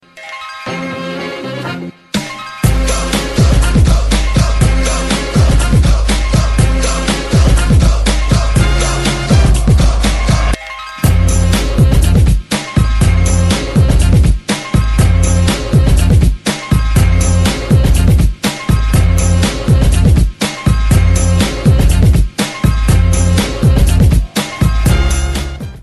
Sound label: Breakdance Beat